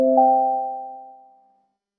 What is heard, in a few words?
synth pluck